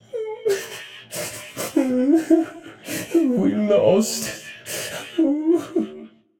boo hoo; smite; arthur

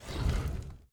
grunt; fenrir

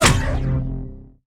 proton fire; smite; zhongkui

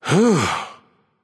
nv sigh; smite; voicepack; xbalanque

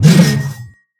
swing; smite; chaac

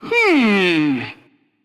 thinking; cupid

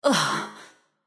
ugh; smite; kali

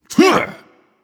grunt attack; smite; anhur

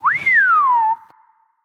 happy; smite; voicepack; osiris

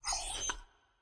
dog whine; smite; scylla

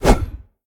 swing; Smite; Tiamat

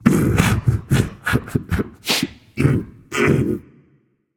boohoo; smite; arthur

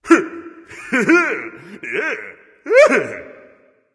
nv yay; Smite; ymir skin12

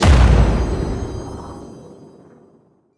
arcane explode; smite; god merlin

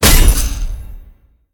hit; Smite; Tiamat

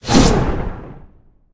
throw; smite; chaac

